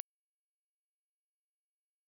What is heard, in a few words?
125 khz